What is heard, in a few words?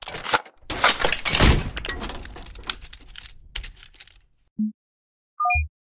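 weapon selection and change in computer game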